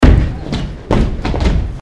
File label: dance group